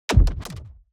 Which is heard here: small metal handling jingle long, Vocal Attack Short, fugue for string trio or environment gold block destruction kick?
environment gold block destruction kick